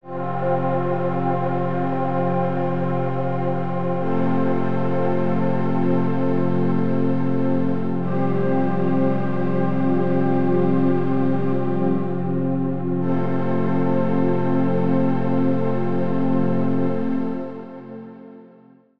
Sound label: Ableton Wavetable Choir; VC Fairness Act